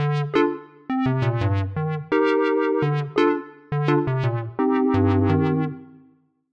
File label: Keys Line 85, 85bpm, hiphop; musicradar